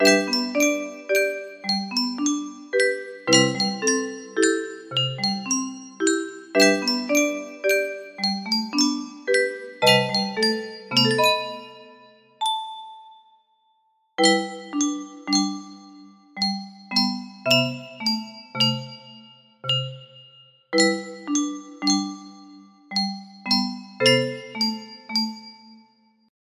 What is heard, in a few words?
lullaby, music box